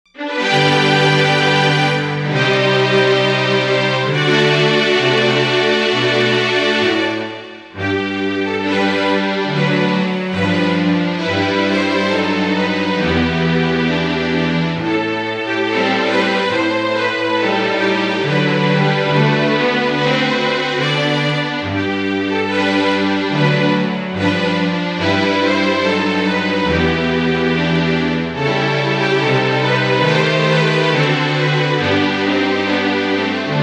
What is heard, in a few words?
Jewish Wedding March, Strings, quartet